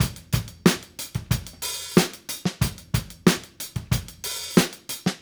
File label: Call And Response Drums B, 70s Analog Funk; Sonic Foundry (Sony Creative Software); What it Is!